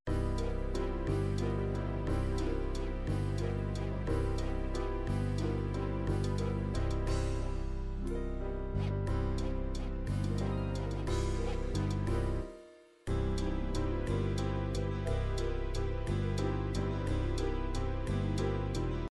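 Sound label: psr9000 viennese waltz, midi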